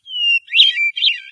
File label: swamp bird; ambient; canals; source engine; hl2; video games